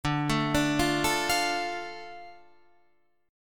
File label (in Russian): Akkord Dm lad perebor